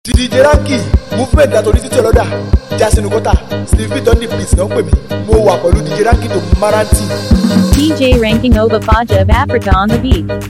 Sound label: BEAT